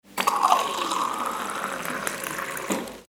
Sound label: filling water into a beaker